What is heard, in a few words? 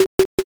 knock